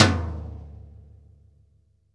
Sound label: TOM, GM drum set; AKAI S-Series CD-ROM Sound Library VOL-8